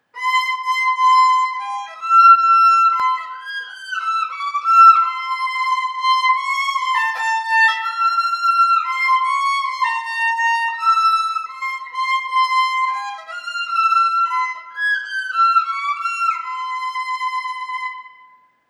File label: VC e minor; Cheryl Violin Project